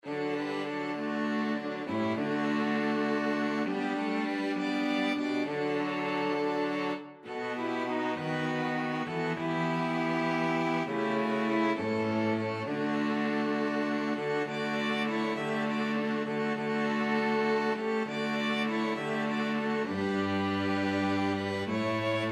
string quartet; eye is on the sparrow

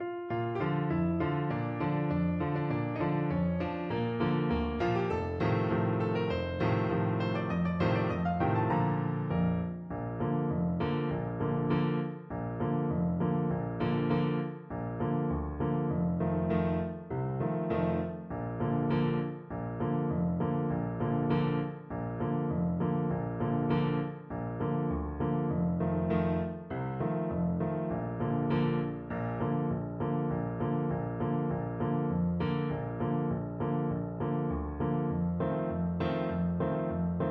ta ra ra, Trumpet

